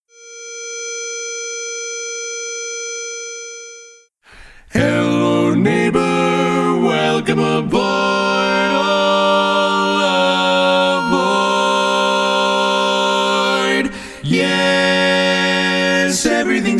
Lead missing; I've Been Working On The Railroad; Polecat II

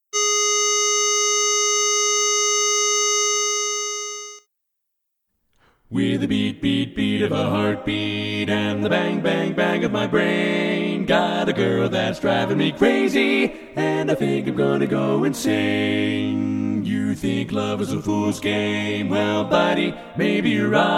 Drivin' Me Crazy, Lead missing; Polecat II

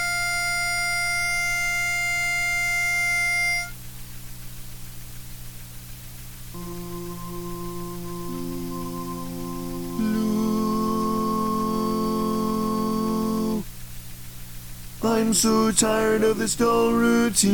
baritone mono; Greater Detroit Part Recordings

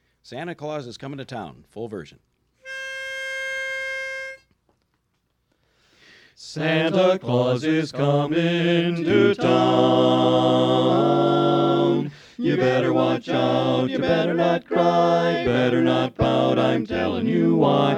bass; Greater Detroit Part Recordings

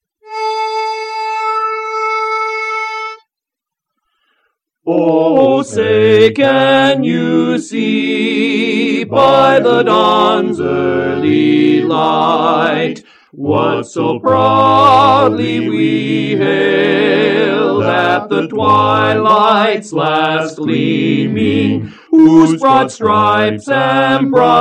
4 part; Star-Spangled Banner

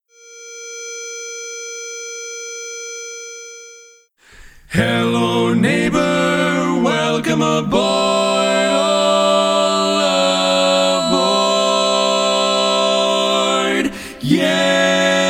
I've Been Working On The Railroad, Tenor missing; Polecat II

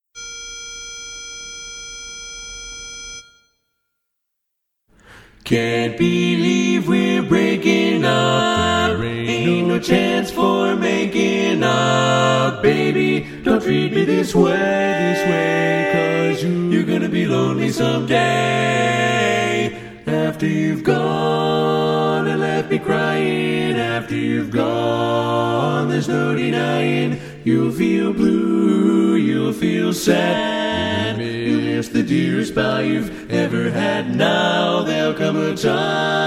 Lead missing; Polecat II

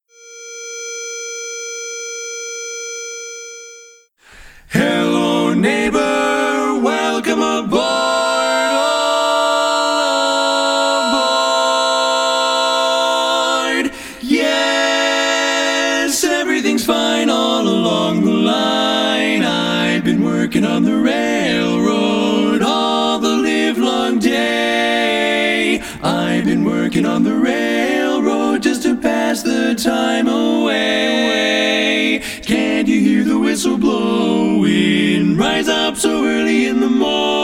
I've Been Working On The Railroad, Bass missing; Polecat II

